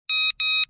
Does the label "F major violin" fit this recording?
No